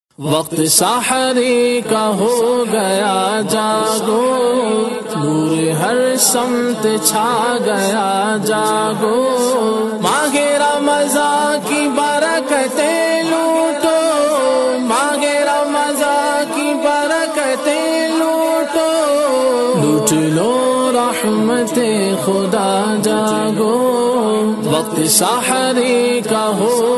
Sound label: Ramzan Alarm Ringtone; ringtones